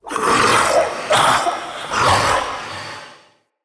Male Vocal Stand, Enemy; Mockery; Mockeries

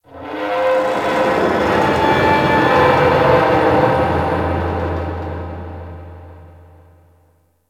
Ocean Pressure Creak; Warframe; Grineer Ocean

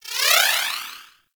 Skip Rope Spawn; Warframe; Characters; Pets; Moa; Tenacious

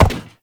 Step Metal; Movement; Uriel; Warframe

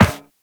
Run Metal Perforated, Footsteps; Warframe; Characters; Operator

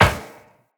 Run Metal Perforated, Footsteps; Characters; Tenno

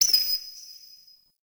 Beach Night Bird; Warframe; Grineer Beach

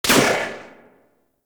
Pistol Shot; Warframe; Tenno; Tenno Bard Pistol

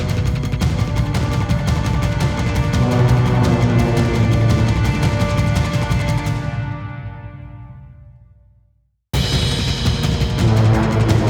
Warp Gate; Warframe; Cinematics; New War; Mission Three

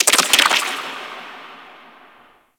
Ocean Underwater Plant Chatter; Warframe; Grineer Ocean

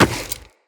Run Rock, Footsteps; Characters; Tenno

